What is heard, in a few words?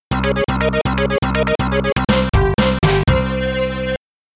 Stage Clear; Pattern Clear No.2; super c; amiga